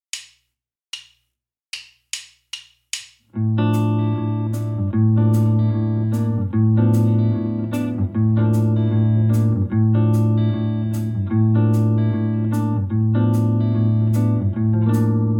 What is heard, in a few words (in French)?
guitare 2, arrangement pour deux guitares